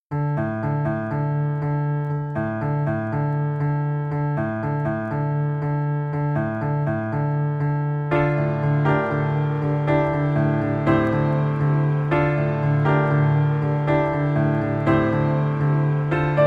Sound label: easy piano